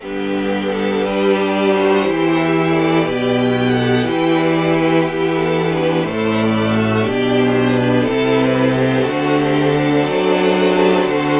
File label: fiddle